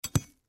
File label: Pipe Drop Dirt Tool Wrench; Objects; Foley; Blastwave